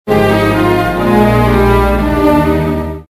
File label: Message, phonetones; KS10